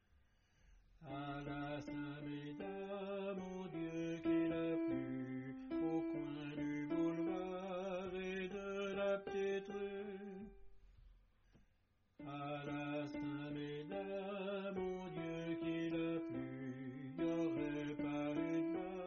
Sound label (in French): tenor; st medard